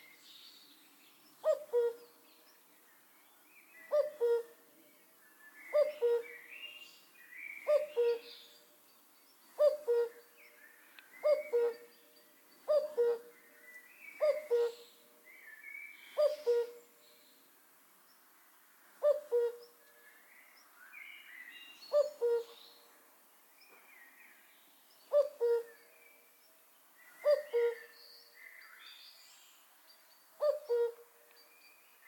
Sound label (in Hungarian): kakukk hangja